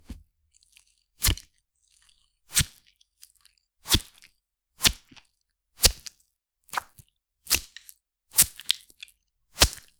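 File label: slashing a ripe watermelon; fruit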